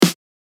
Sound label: SNARE; UNISON